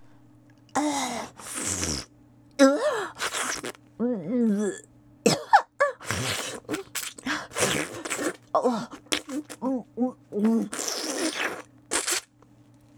喉奥フェラ

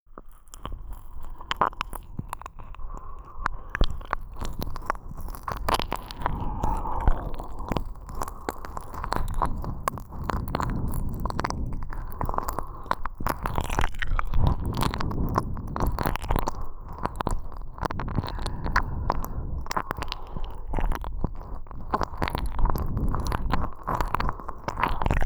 耳奥ドスケベ舐め癒やし（左）, ASMR

